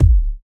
tight lows kickdrum g sharp key, Royality free steel kick drum sample tuned to the G# note